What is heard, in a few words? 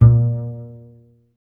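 string contrabass A; Miroslav Vitous; Solo Instruments VOL-2; Solo 2 Bank 1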